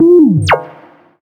Droid Chatter; Zombie Catchers

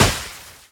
snowball hit; Scrat

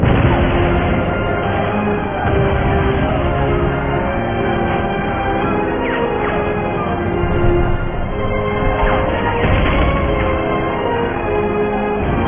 Stalingrad Ambient Music

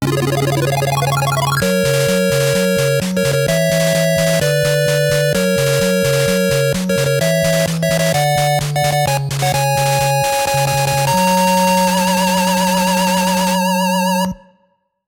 All Stage Clear; rockman megaman complete works

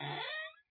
Open door; papas cupcakeria online windows gamerip 2013

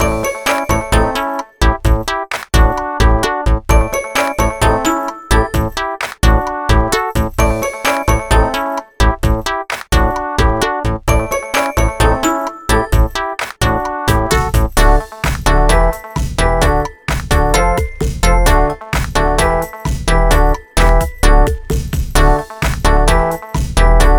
Happy

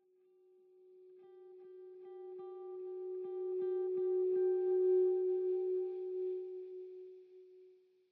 Tension Mood

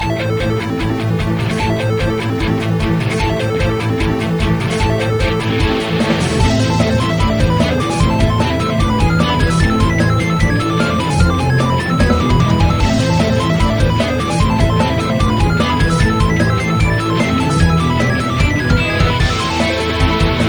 music battle; Soda Dungeon; Android

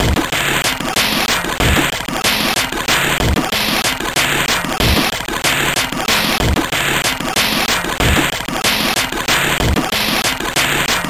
In Game Bgm; Night Shift; amiga

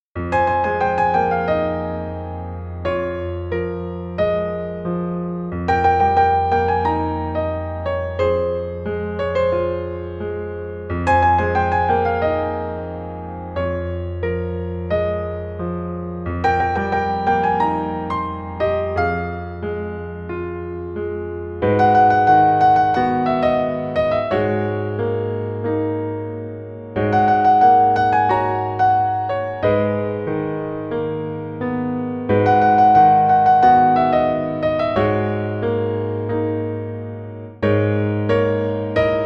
Piano Arrangement; Fairy Fencer F Refrain Chord; Frozen Polar Nights

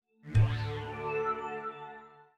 Portal level-start; Space Otter Charlie; PS4

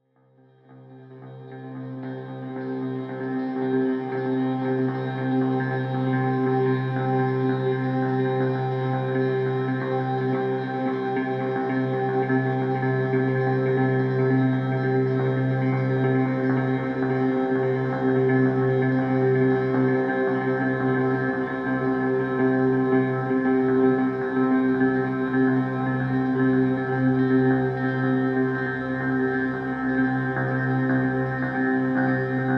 Suspense; Suzerain

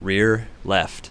Music Rear left

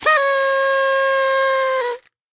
Horn

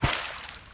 SFX Fizzle

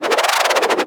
State Crashed

